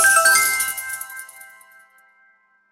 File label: sound all correct